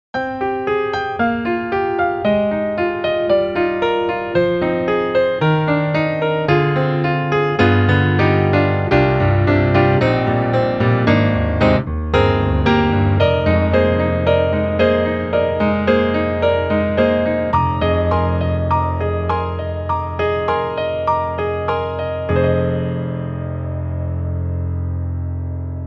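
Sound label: Custom C7 Soft Pop, piano; Spectrasonics; Keyscape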